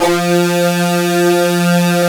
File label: SYN OBX-A, SYN Analog; Sound & Vision; Gigapack I CD 2